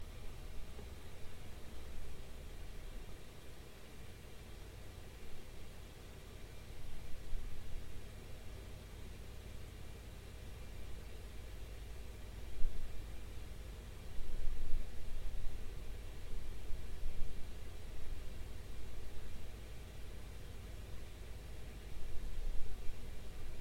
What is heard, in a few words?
evp session white noise example